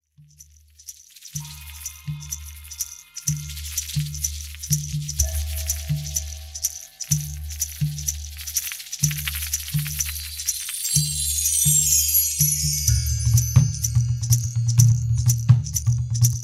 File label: FIRE AND FLUTE